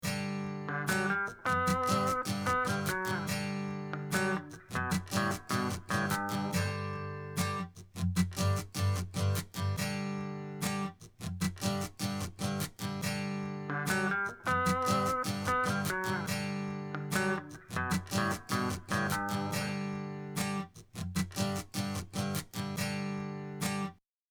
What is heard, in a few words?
G Major Strum With Lick, guitar; avoid playing bad notes